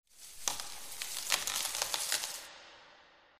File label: free Spin Start; bloodsuckers2